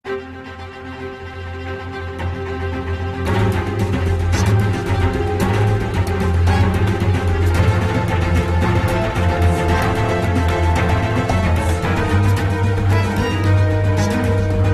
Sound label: Action full loop